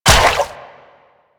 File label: step water; Enemies; Warframe; Infested; ZealoidPrelate